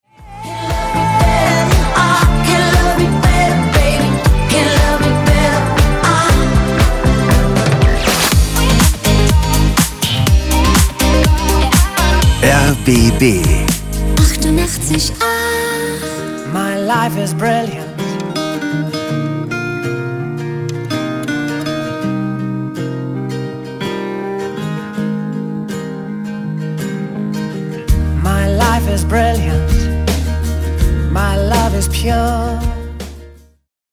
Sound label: Transition Short